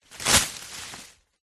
tree branch thick healthy leaves impact slap against surface; foley; zapsplat